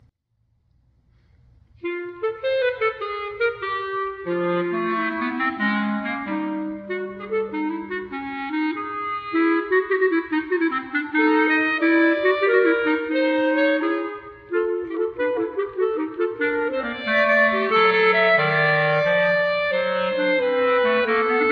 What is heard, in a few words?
Jig